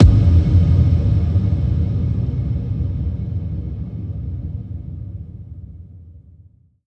Hit, звуковой эффект в стиле техно транс

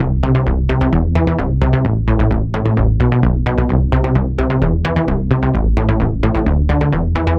Bassliner; 90’s Eurodance

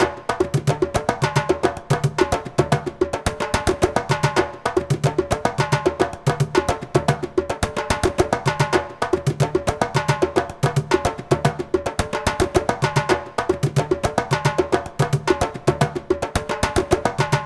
African Hand Drums 110 BPM; Перкуссии (Percussion Loops)

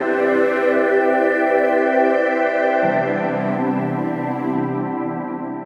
Sweet Pad, пэды

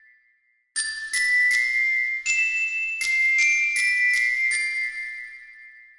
Glockenspiel; vibes

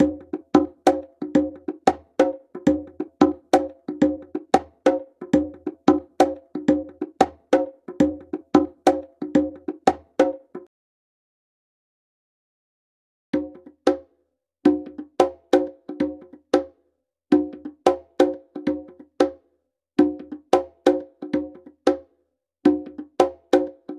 High Conga 90 BPM

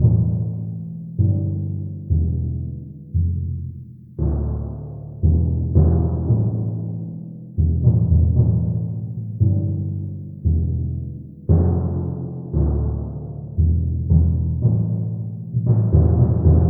timpani; драма

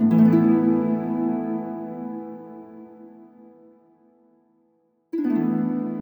harp

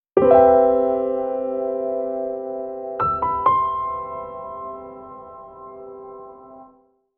Bossa piano; Latin (Латино)

